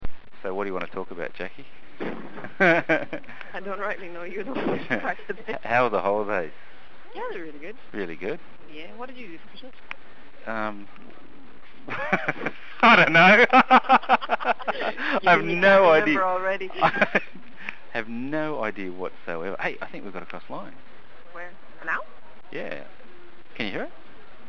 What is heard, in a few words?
Crackling